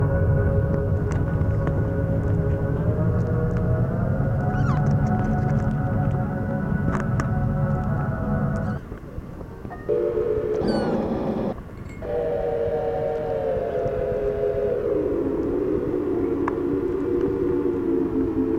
kittens

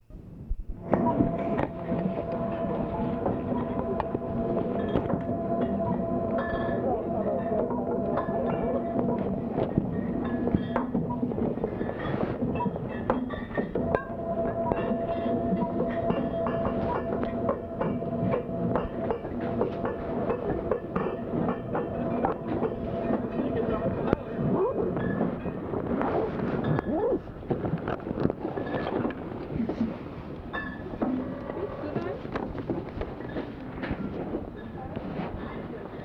bottles

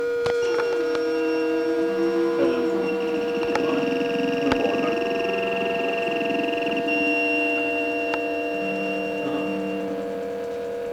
furry signal

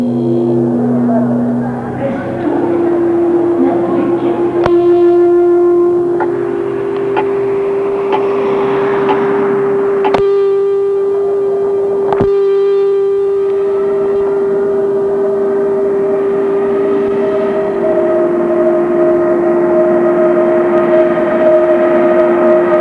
train

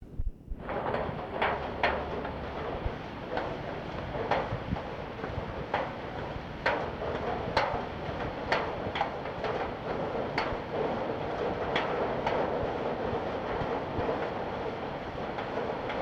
rain

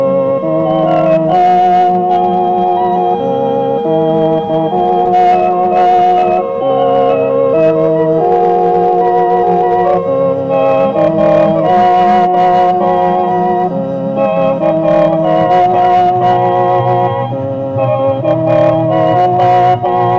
hammond springtun

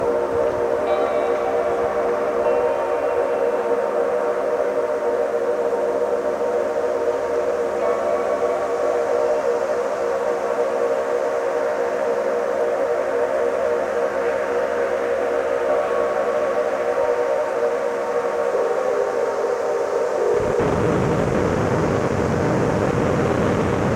rain

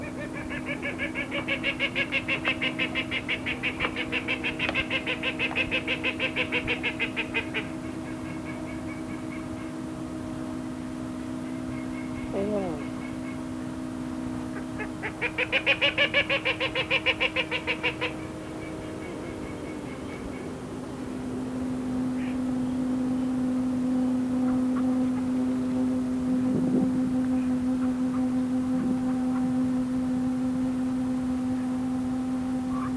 ducks

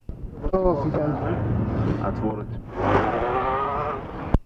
saw

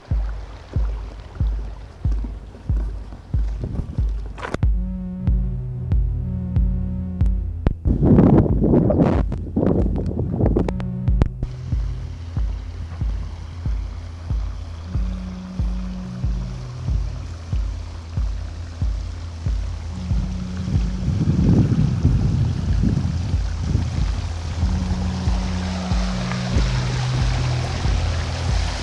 beat stream

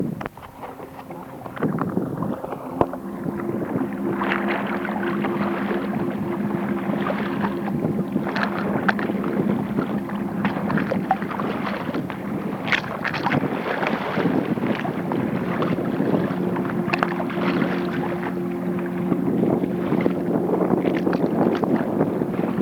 boat

